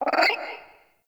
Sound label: Forest Open Glade Animal Far, Day